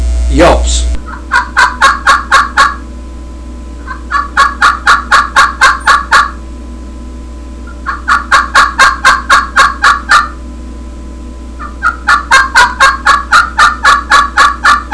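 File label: triple throat glass yelps, turkey call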